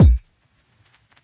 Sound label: kick; eddyflux; crate bd; dough-samples